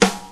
clean acoustic snare sample g key; snare drum samples

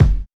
nice kick single shot f sharp key; kick drum samples

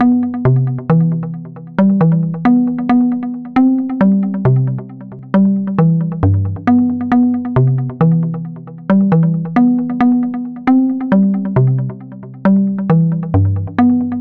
wooden plucked bass em 135; instruments; loops

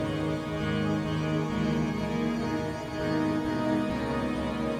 Space Wind Synth Texture 100 bpm

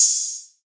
muffled open hi hat sound g sharp key; open hat samples

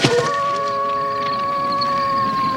wolf howl medium

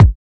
verby kick drum single shot A key; kick drum samples

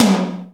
high floor tom single hit f sharp key; tom drum samples

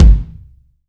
ambient kick drum g sharp key

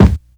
mid heavy kick d key

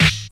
00s hip hop snare sound d sharp key; snare drum samples

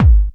rich lows bass drum single shot g sharp key; Duration 0:01; Channels Mono

